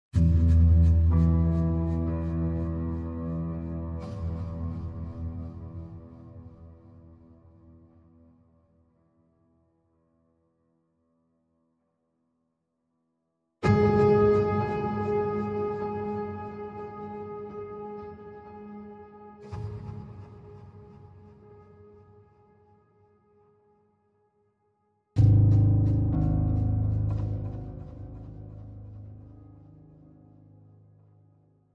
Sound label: Pianos Only